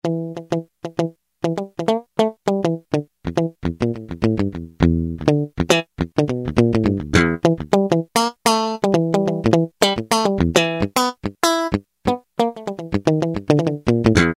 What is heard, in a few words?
Mute Sw EG, Roland U-110; Factory Patch